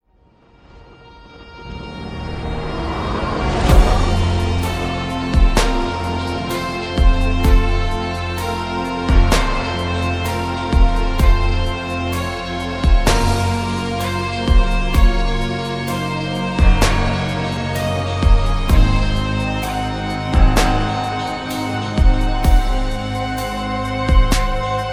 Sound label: ADAGIO FOR STRINGS